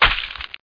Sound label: splat; outlaws